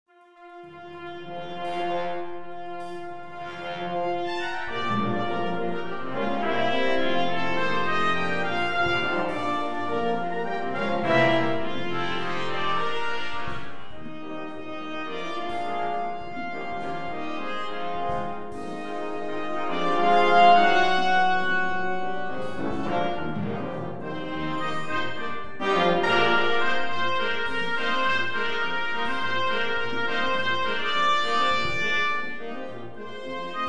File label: brassband